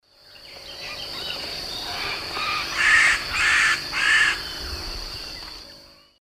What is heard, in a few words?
Piculus chrysochloros, calls; S. America; Eastern Lowlands